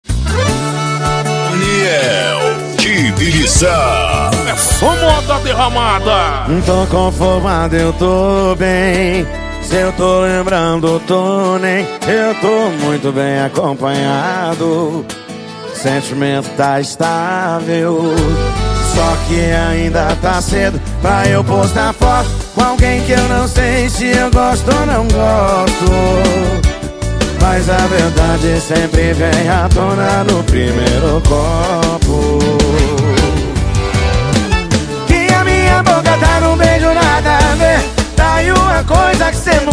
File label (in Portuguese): sertanejo com locutores de rodeio